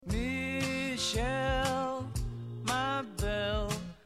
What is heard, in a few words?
Melody